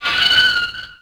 door gate open; left4dead; re doors

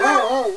zombiedog die; zombiebot

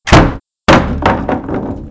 checkpoint plank remove, doors; left4dead

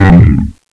heavy hurt, zombie; ZombieDarkness

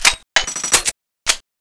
change a empty; cstrike; weapons

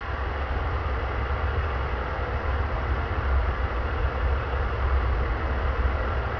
spout; cstrike

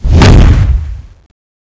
zombi bomb normal; cstrike; CSO; LightZombie

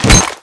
chainsaw slash, weapons

